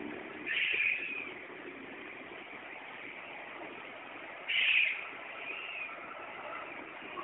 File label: Black naped oriole